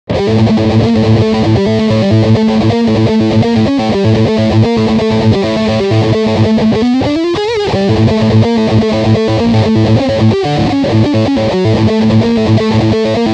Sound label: Riff Bridge Pickup